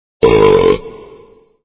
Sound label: sphinx burp